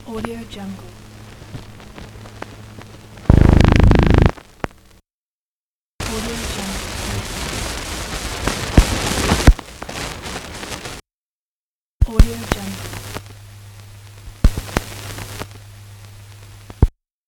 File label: glitch noise